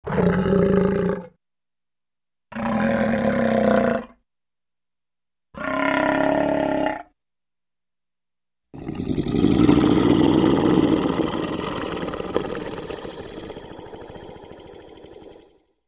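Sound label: lion voice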